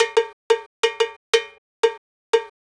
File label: Cow Bell, Latin Percussion